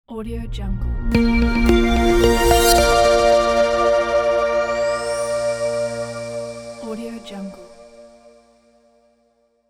elegant logo; audiook

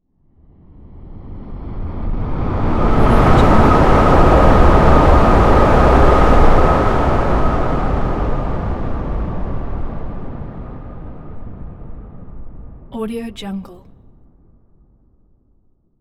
elemental tornado; soundmorph

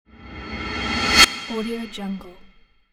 metallic whoosh

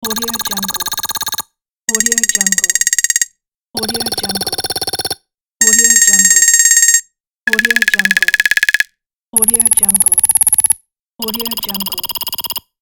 score count

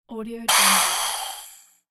remove air

